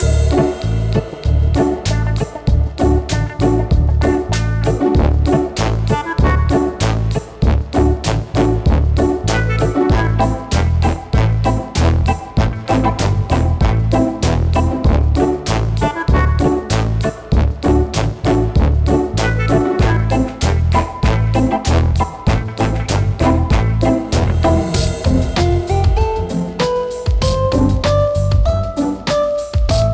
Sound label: Menu Music; Pudding Monsters